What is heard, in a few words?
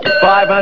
ching; usa